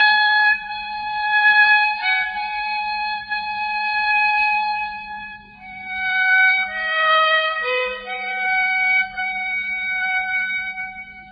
Instrumental

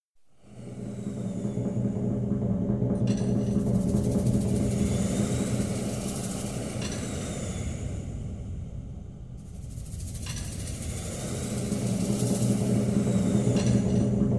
Instrumental Music

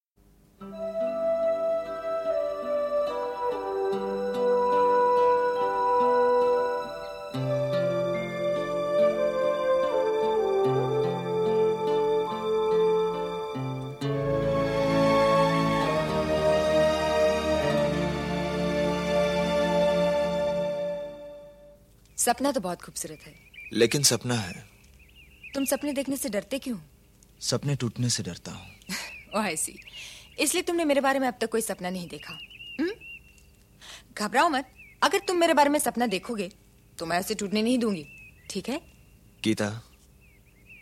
Instrumental

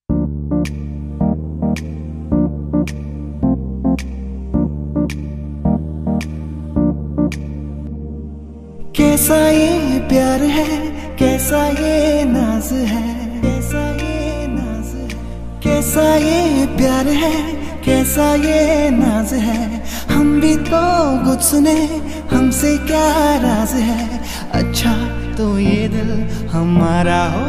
Unplugged Cover

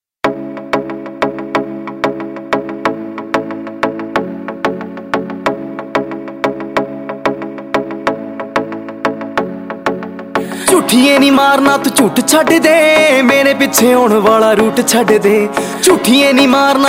Whistle

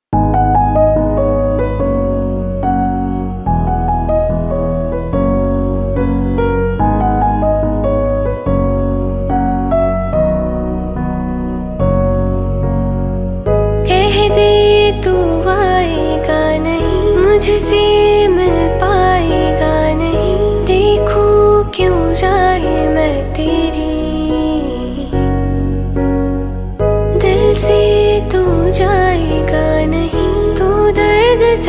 Female Cover

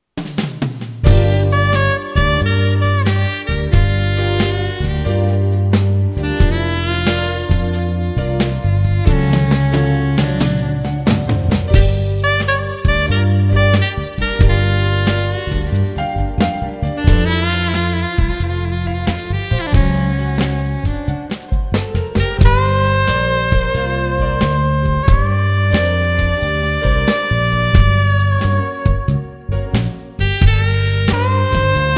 Saxophone Version

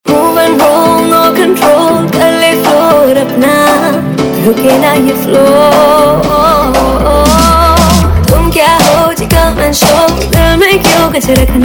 Disco Dhin Tak Dhin Tak Dhinn

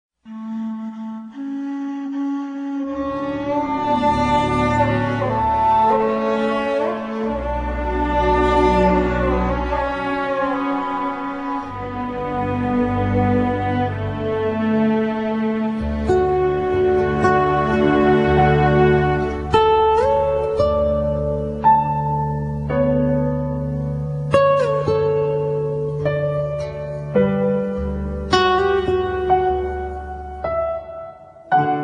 Grief

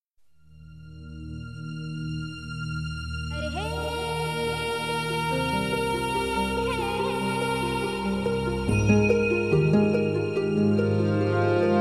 Instrumental Music

